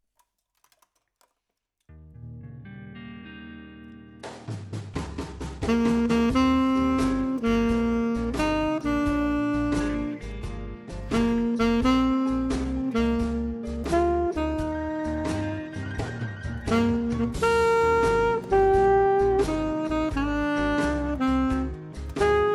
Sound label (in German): happy birthday mit saxofon